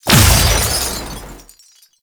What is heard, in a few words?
Chain Laser Start; Roathe Summon Catenach; Warframe; Tau